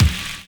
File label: RAIN BEAT; Univers Sons - Kitch 70s